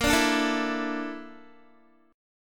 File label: Bb Major 7th Flat 5th, Guitar; strummed; 17,17,14,14,16,x; 4F,4A,5C,5G,5C,6E; BbM7b5